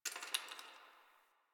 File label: Ice Crack; Warframe; Nineteen Ninety Nine